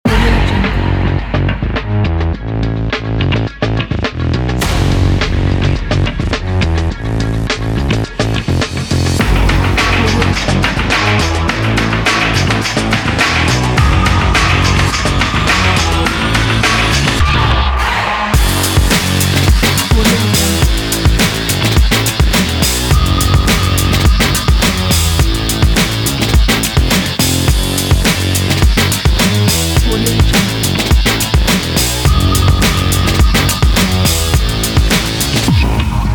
action sport

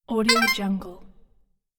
correct answer

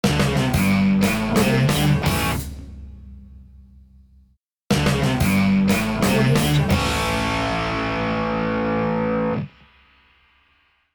rock stinger logo ident

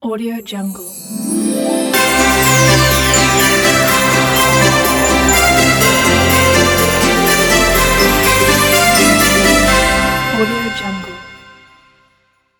corporate news

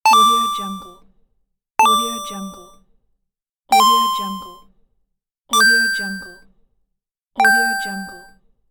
8 bit coin

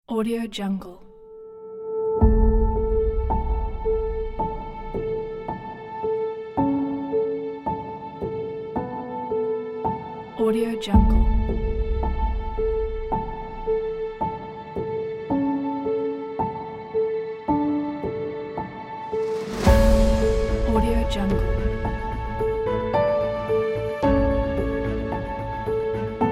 christmas inspiration story; trailer music

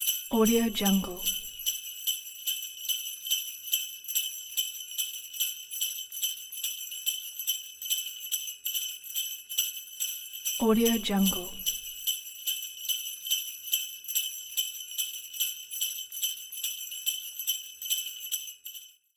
the christmas bells

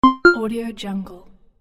short sms tone

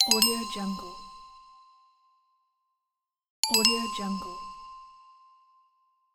light bulb

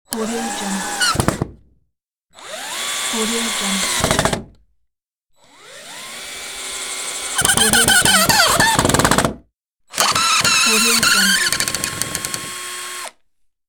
drilling screw in wood

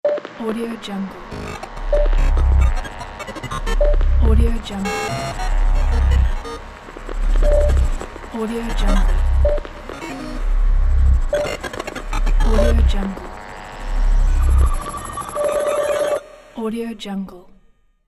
tech cybernetic device

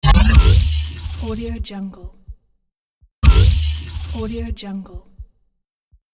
glitch impact

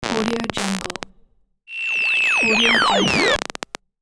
digital scratch

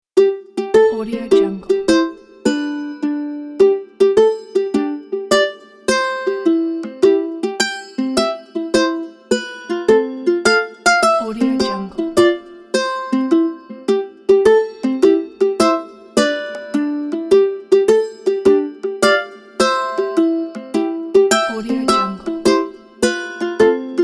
jumping ukulele happy birthday to you

